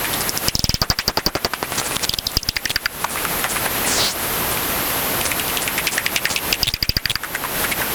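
back garden common pipistrelle Batbox Duet L FD10 R heterodyne 45kHz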